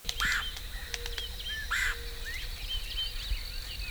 Red headed Woodpecker; woods; Ariel Foundation Park

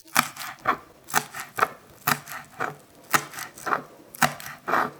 chopping food on the table

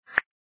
notification sound

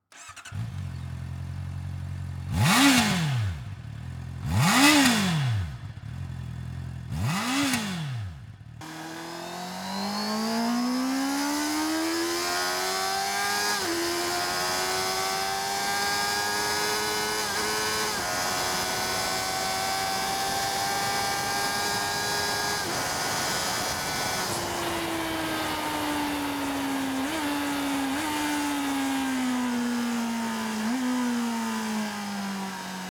CB650R sound, motorcycles; cb650r neo-sports-cafe 2021; street

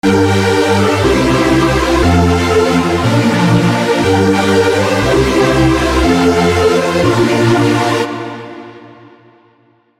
Pad; Pad Generator

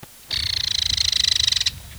Belted Kingfisher; west lake; Ariel Foundation Park

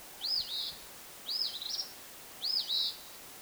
Eastern Phoebe; woods

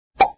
corn pop

